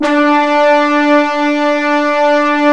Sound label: BRASS D3; Giga Samples Collection; SAX+BRASS